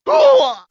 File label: "ooh ah", voice